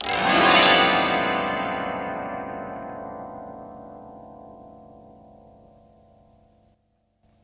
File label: autoharp open, Chords; Multisampled Autoharp